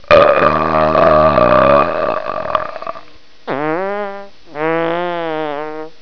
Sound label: duet